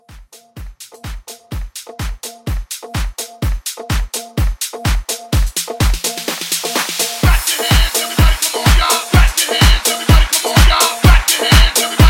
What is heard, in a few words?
House Mixshow Edit 126